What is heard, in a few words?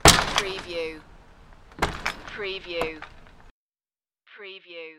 Screendoor Bang